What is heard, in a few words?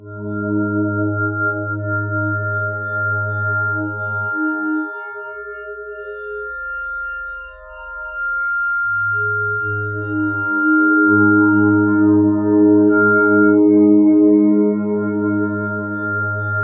HI BAGPI, Ominous Drones; USB Soundscan vol.48; AKAI